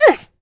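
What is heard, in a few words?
Ooff, British; hedgewars; Default uk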